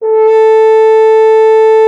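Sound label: French horn A4; Xsample VOL-3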